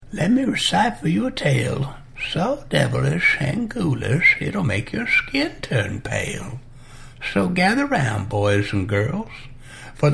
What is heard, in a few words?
whistle